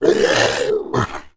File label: Attack; TR3; Willard